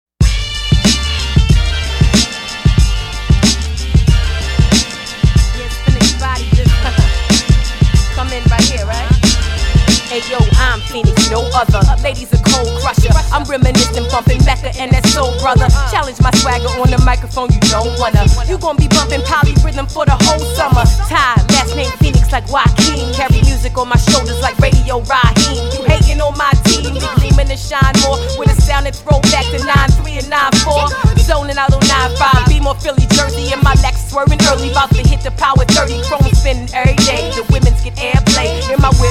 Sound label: polyrhythm break glass